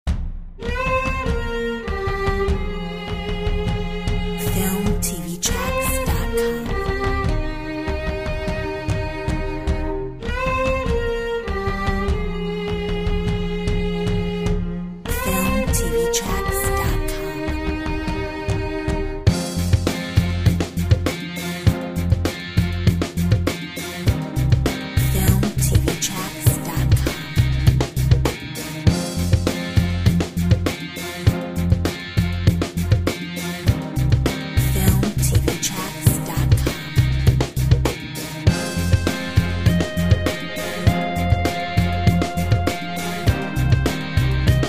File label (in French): Quatuor; Filmtv-tracks